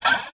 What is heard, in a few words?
Multimedia Internet CD-Rom Flash Wipe